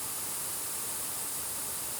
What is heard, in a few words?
Spray Loop